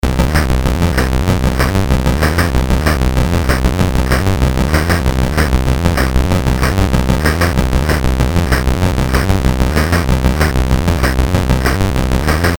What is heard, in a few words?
CSG8580R5, GAMES; Ufon Lahtaus BASIC; HVSC